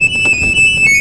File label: ACC TRILLER; Best Service; World Colours